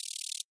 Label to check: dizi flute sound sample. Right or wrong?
Wrong